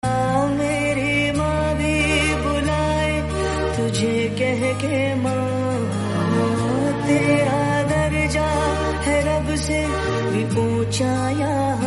romantic hindi

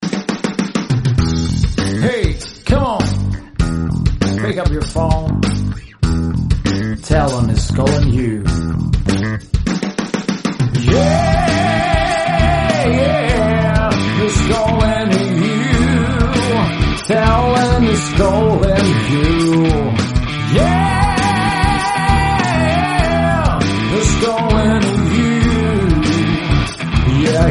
rock

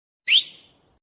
message tone notification sound

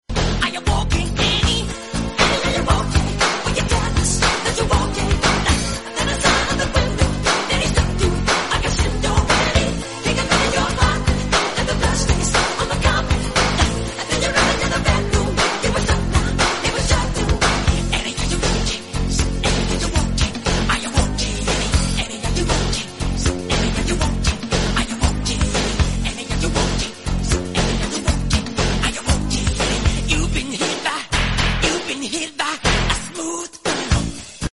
english instrumental

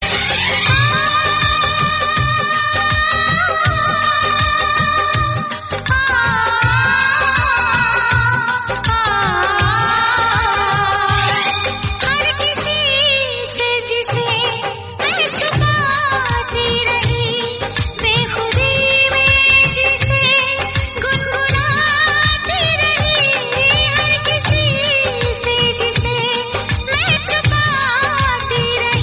song

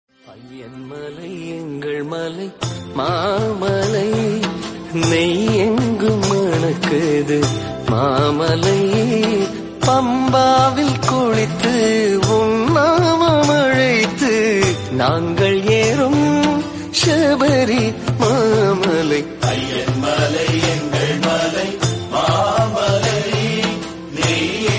tamil devotional; ayyappan swamy

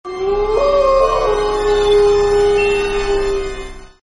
ghostly groans

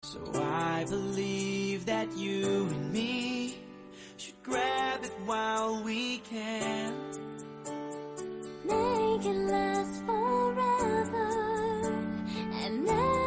english; high school musical 2; troy

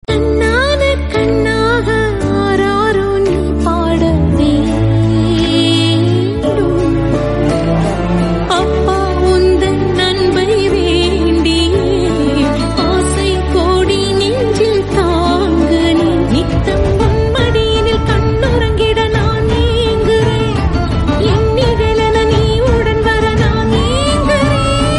Tamil serial song

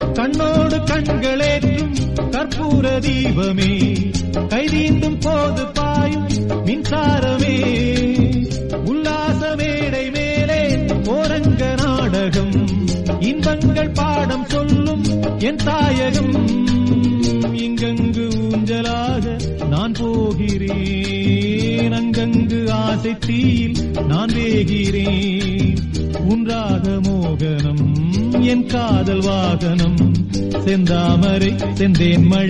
song tamil

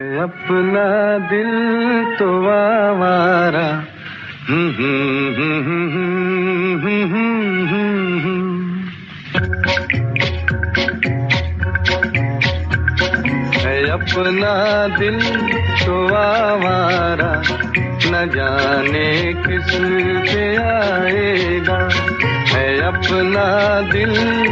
hindi

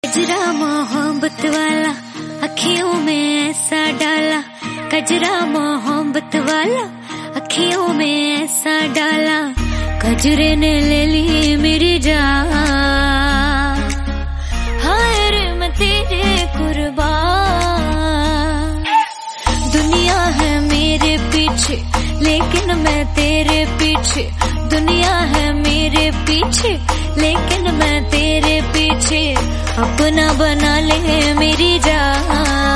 female version

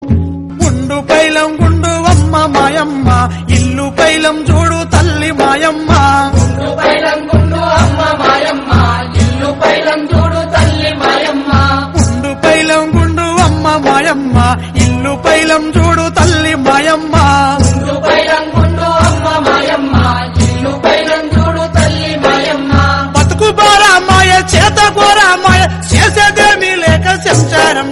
janapada songs, telugu, telangana

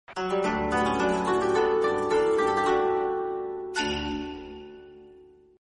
piano; One Piece

